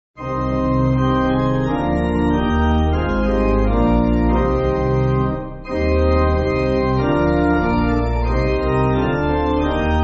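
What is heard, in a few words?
Pipe Organ, Backing Files for Churches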